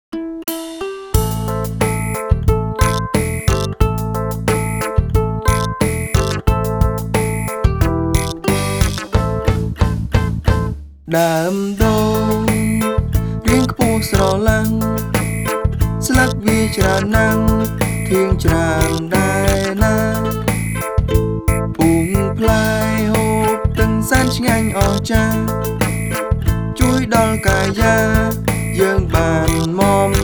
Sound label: Coconut Tree Song; បទចំរៀង-ដើមដូង